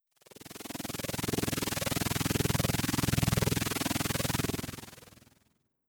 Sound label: Radiant Drone; Engineer